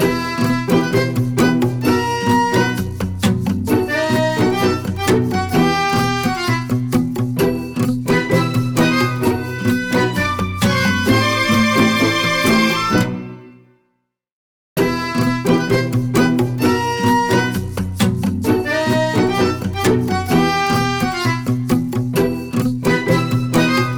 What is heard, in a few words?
Pleasant Bandoneon, ringtones; LG Velvet